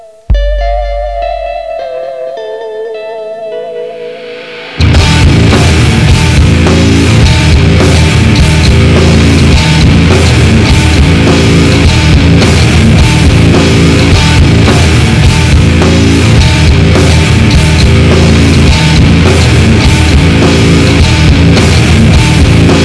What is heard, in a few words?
rock